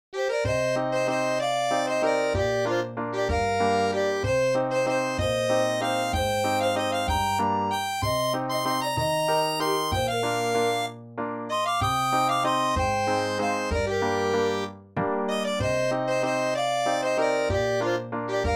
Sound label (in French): balade finlandaise